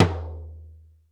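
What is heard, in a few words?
DRY KITS, dry tom; Crypton; DrumBase5000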